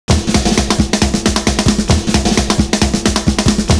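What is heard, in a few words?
blues snare, 133.5; Univers Sons - Kitch 70s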